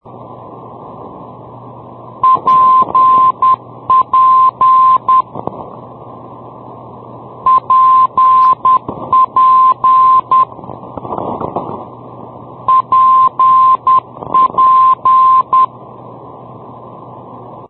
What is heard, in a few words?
ndb paiol rio de janeiro